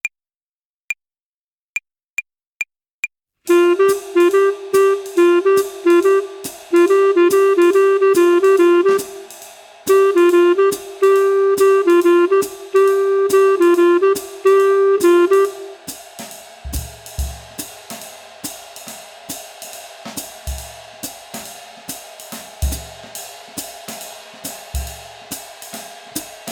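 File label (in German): Swing Phrasierung Übung; play along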